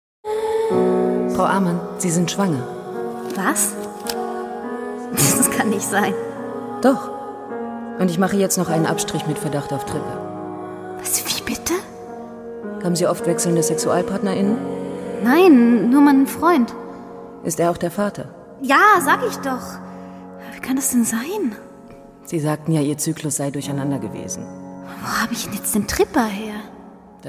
10 Atemzuge

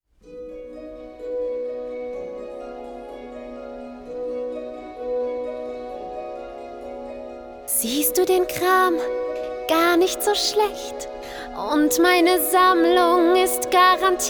Arielle die Meerjungfrau Song

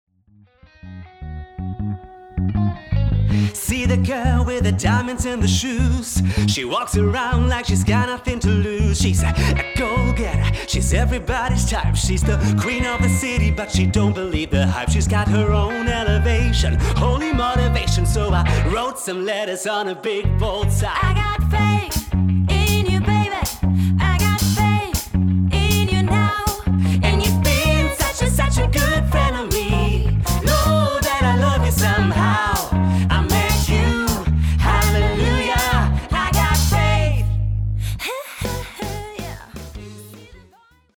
Gesang; LOFT VOICES

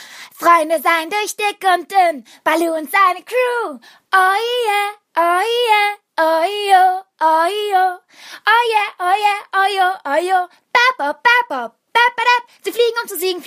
Gesang Kinderstimme; LOFT VOICES

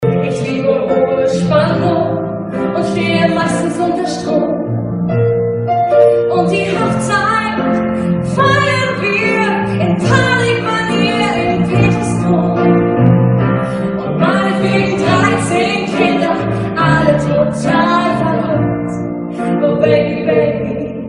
Gesangsmedley Synchron; LOFT VOICES

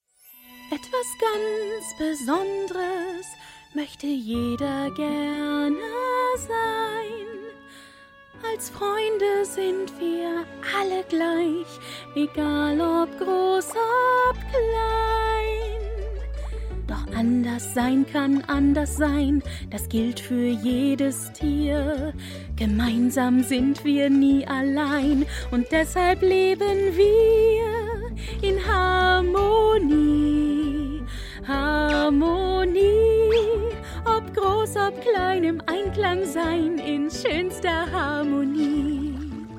Gesang Kinderserie; LOFT VOICES

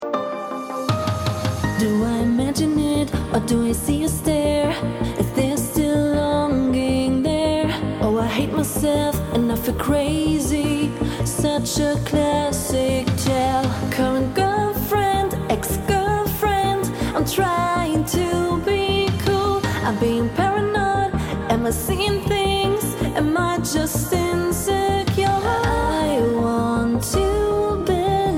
Mein Song

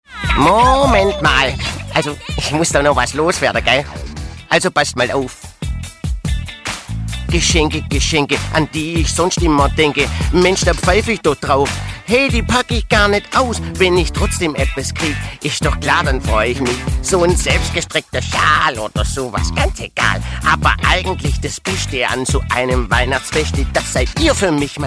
Kinderlied Rap Trickstimme Frosch schwäbisch; LOFT VOICES

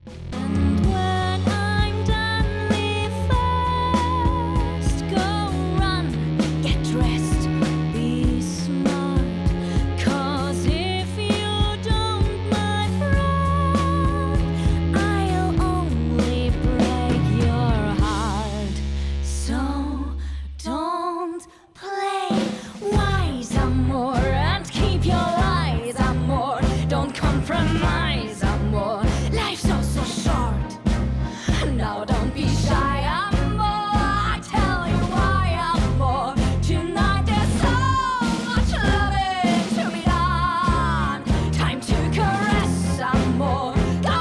crossover klassik rock

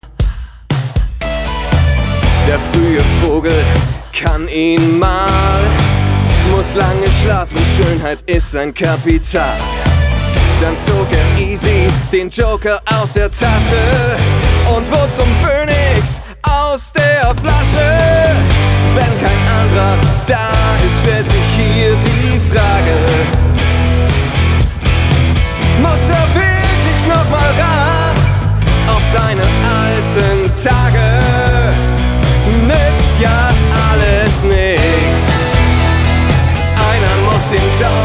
Deutsch Rock

